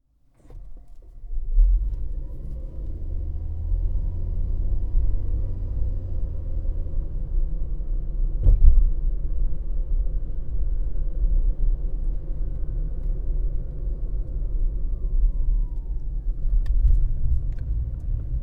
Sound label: Driving Fast Hiway Int, Prius C (Hybrid); 2014; Holophone; Secret Source